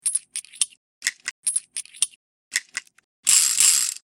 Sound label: dual m1895 reload; weapons